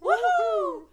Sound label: woohoo